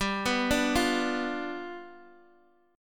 G 7th G down Guitar DADGAD

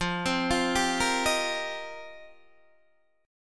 F 9th Suspended 4th F Guitar Open D, chord

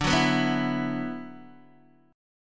D 6th Add 9th, Guitar DADGAD

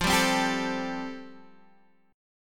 G 7th Suspended 2nd Suspended 4th F chord

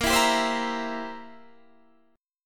C 7th Bb

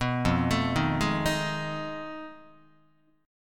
F 7th Sharp 9th Flat 5th F Guitar; arpeggiated; chord; F7#9b5; 2,1,0,1,0,2; JGuitar

